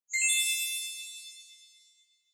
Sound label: Bell Accent, Chime Dry